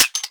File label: Sentient Pistol Mech; Warframe; Amalg